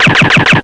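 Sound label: machine gun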